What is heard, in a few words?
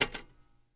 Typewriter Bad Key; typewriters